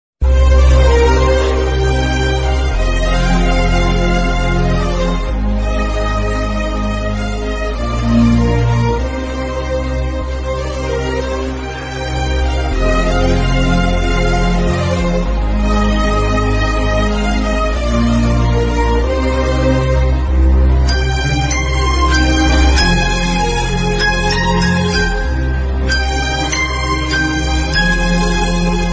bgm ringtone; manmadha movie

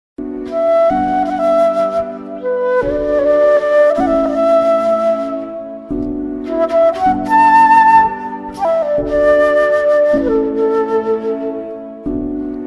south indian bgm ringtone tamil ringtone; adithya varma

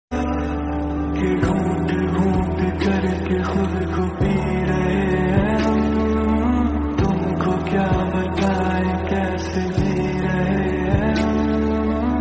attitude ringtone bad boy

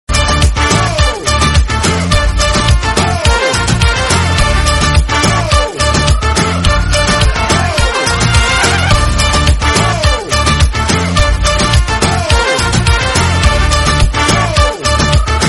Instrumental; yeh jawaani hai deewani

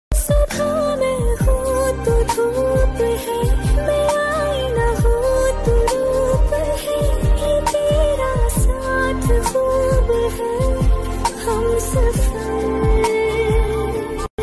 female version hindi ringtone; love status

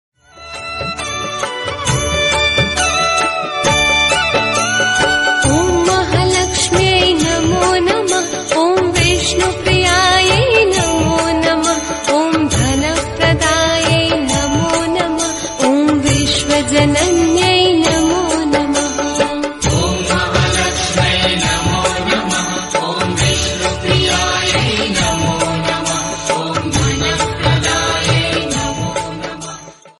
bhakti ringtone hindi ringtone; lakshmi ji

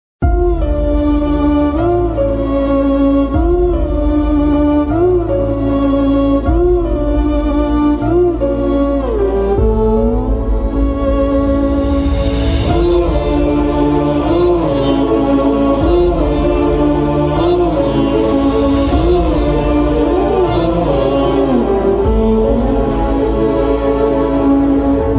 emotional bgm ringtone telugu bgm ringtone; tuck jagadish

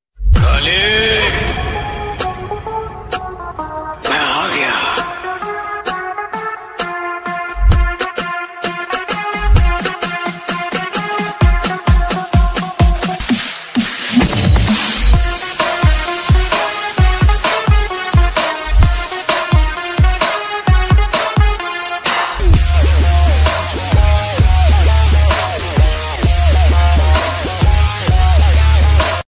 remix ringtone mass attitude ringtone

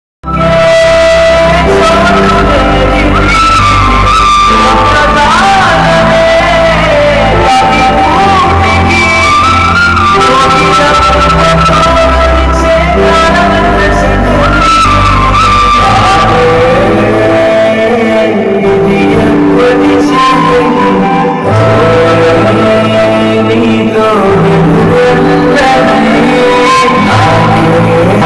sad song bgm ringtone climax sad bgm ringtone; ambajipeta marriage band movie

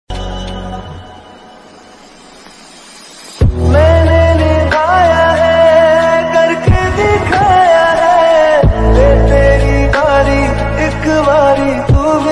hindi romantic love song

